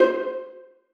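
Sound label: violas pizzicato c5; Sonatina Symphonic Orchestra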